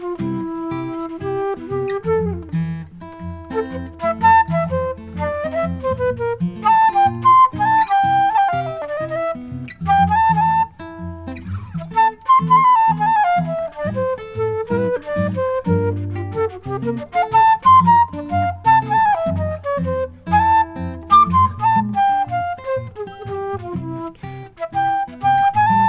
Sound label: Flute